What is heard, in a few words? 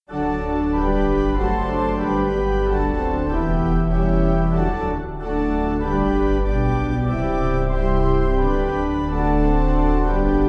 Pipe